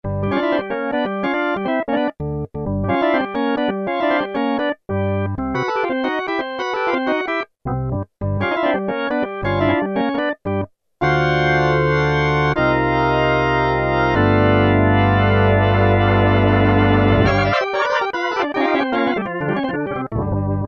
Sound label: Mellow Rotor